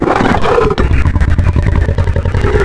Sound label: Leopard, Animals Wavetables; Cymatics; Serum; Animals for Serum - Gold Edition